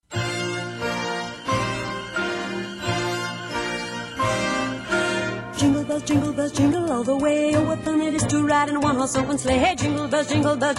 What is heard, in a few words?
Jingle Bells